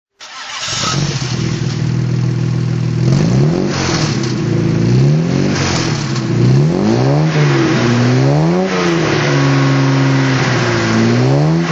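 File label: jaguar mk2 34L manual throttle; Jaguar Mk II 3.4 Litre, 1964; Engine sound; V3D/ ClassicarImages, Marc Vorgers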